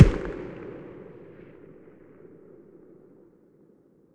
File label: mg muzzle mono very far, weapons stationar